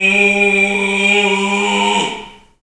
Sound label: Bullhorn, Afro Horns, Africa